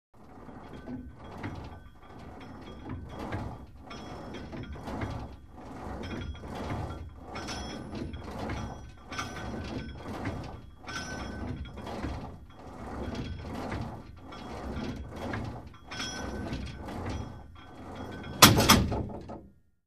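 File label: Gym Weight Equipment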